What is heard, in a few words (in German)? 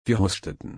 husten